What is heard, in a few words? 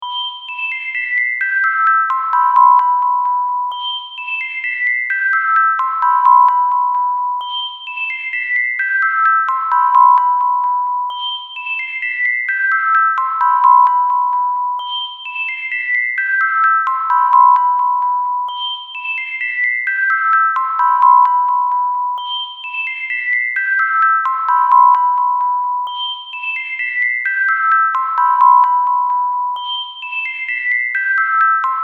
jingles